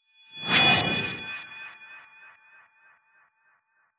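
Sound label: Attack Mamluk swoosh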